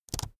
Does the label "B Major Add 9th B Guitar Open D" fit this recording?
No